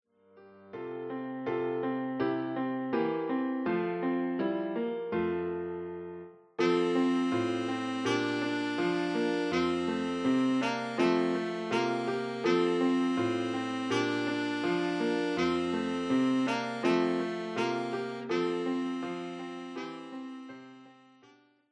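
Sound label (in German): O du froehliche, Altsaxophon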